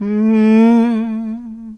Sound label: Healer Attack Vocals